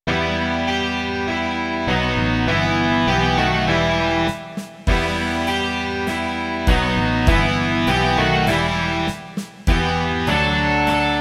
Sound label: rock